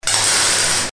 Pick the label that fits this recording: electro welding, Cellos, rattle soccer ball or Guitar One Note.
electro welding